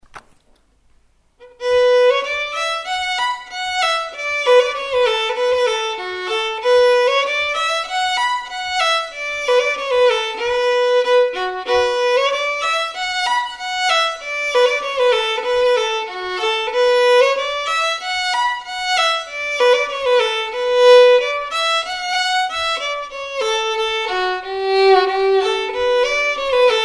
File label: Polka; Prelude Polka